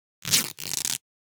Cloth Split Rip Tear - Short - Foley Cloth Movement; Hollywood Edge; sound designer's toolkit v2